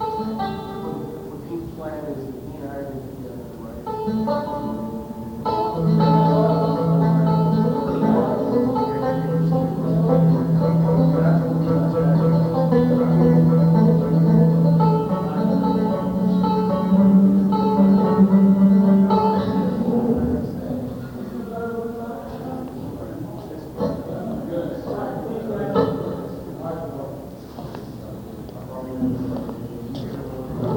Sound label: soundcheck